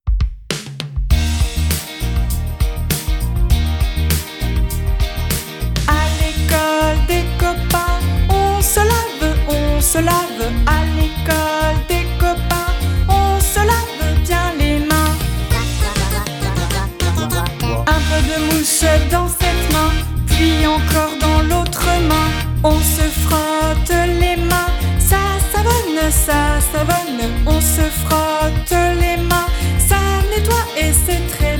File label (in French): Chant